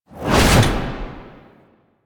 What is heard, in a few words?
Alchemy Grenade Throw; Warframe; Entrati